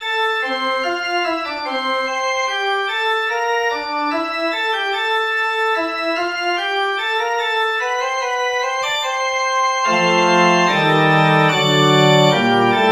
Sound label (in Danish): forspil